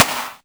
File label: SD, korgdrums; Korg Electribe; ESX-1; electribrary-3